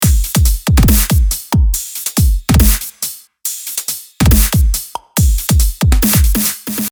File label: Dubstep